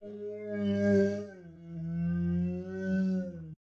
Ice Giant Death Vocals

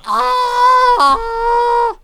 Death Vocals; Snake Archer

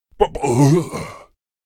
Death Vocals; Zeus

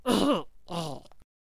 Death Vocals; Void Cultist

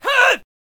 Squire Attack Vocals

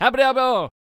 Attack Vocals; Flag Bearer

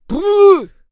Protector Attack Vocals

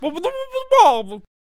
Potionseller Attack Vocals

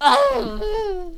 Death Vocals; Snake Archer

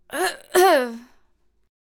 Celestial Aegis Death Vocals

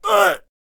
Spear Thrower Death Vocals

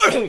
Longship Death Vocals

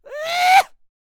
Attack Vocals; Shouter

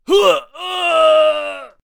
Chieftain Death Vocals

